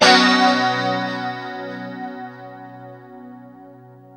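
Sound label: chord B 3, guitars; Spectrasonics; Hans Zimmer Guitars VOL-1; JC-SHEEN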